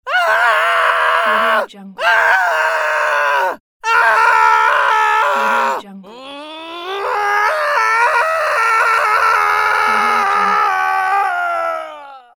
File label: man screaming